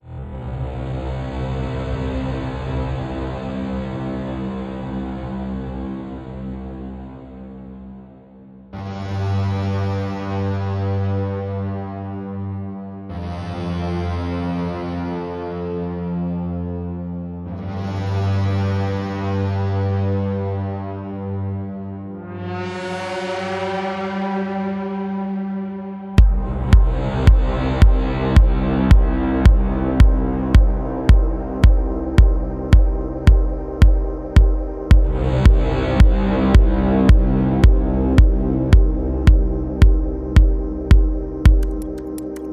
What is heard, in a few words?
Bass Drums; Bizet LArlesienne Remix